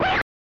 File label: Gorilla Voice; Crazy Climber